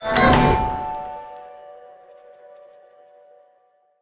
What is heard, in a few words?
melody; smite